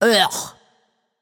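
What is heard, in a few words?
ugh, non-verbal; smite; voicepack; tiamat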